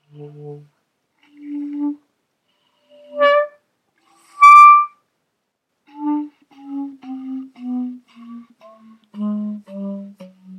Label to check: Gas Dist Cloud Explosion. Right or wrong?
Wrong